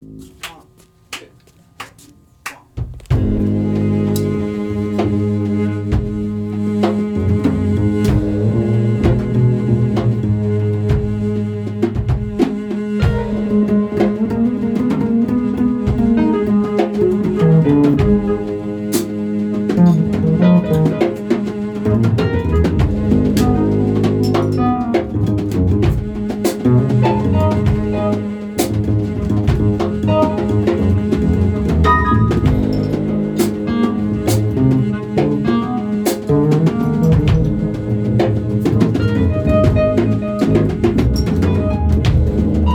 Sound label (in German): Solo Bass mit Cello Backing; Probe